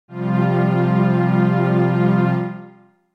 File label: F F Suspended2